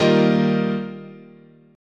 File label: D sharp Major Add 9th, guitar chord